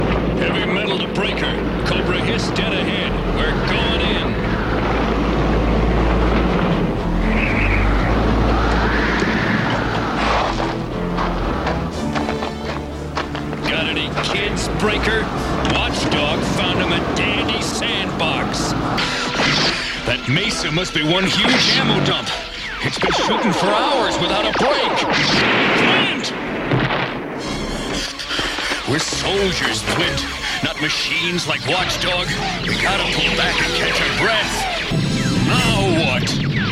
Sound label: heavy metal